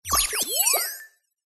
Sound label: science fiction computer tone fm activated or similar; zapsplat